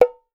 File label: miniature bongos; Voice Crystal - Steve Reid Definitive Percussion